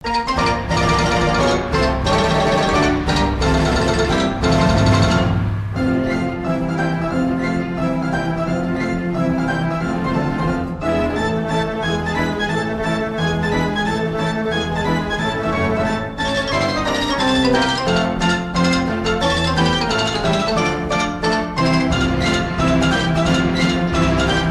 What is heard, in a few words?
wurlitzer frisky rag; WURLITZER STYLE 34A MANDOLIN PIANORCHESTRA